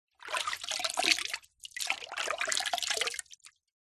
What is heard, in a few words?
household water splashes movements in plastic mop bucket; zapsplat